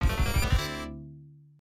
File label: Coin; Cameltry; F2 System Arcade